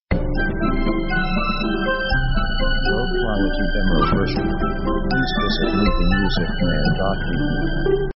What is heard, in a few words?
orc exhilarating